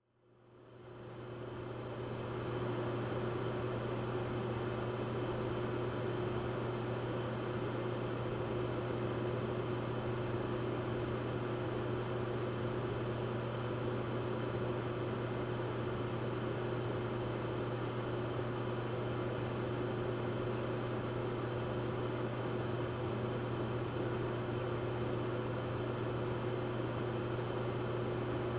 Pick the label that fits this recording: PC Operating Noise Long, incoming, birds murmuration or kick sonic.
PC Operating Noise Long